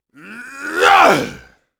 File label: attack voice; UFC Tapout